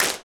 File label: CLP HANDS, Handclaps; Roland LCDP14 Africa VOL-2